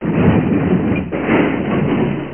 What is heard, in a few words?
Car Crash